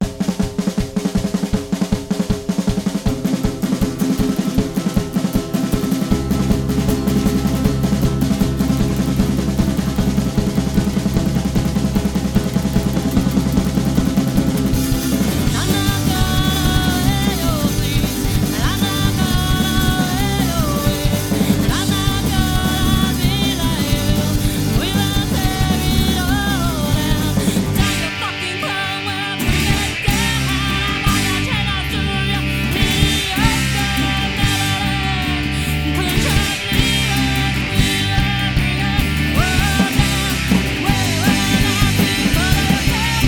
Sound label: Player Piano, Acoustic; Proteus